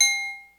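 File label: triangle low; xr10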